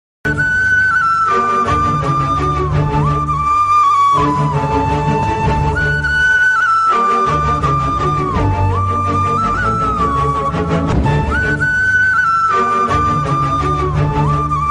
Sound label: ringtone tamil bgm